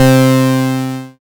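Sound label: BAS MKS-50, bass, Roland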